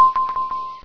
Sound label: Ping da ding ding ding